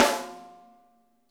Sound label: snare rim; Sonic Reality; Interactive Drum Kits; ID SNARE 2+